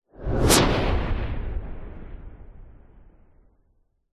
sound design whoosh short fast powerful crack long tail; zapsplat